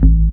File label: DR. LOOP, BASS; X-Static Goldmine 3